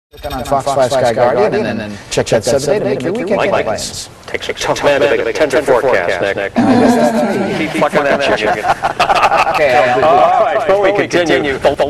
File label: chicken; cave